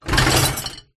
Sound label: household dishwasher shelf slide